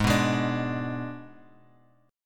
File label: Ab Diminished Ab Guitar Open F; Abdim strummed; JGuitar